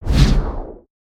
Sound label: Full Swing; Summoner Valiant